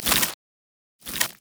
human emote laugh; Elise

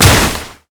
basicattack; Ziggs; snowday

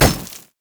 axe throw grab; olaf

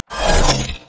mystic shot; LoL; cyberezreal

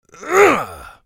effort

